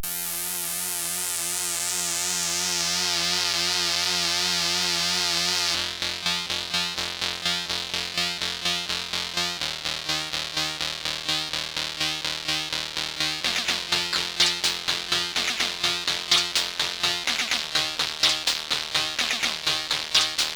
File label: Rock Tune, CSG8580R5; The Dream Maker; 3D Rock Tune